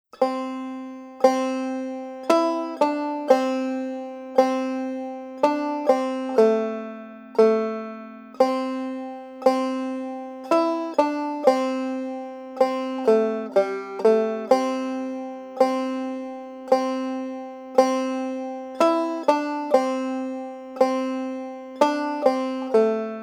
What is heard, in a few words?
Melody; Sugar Hill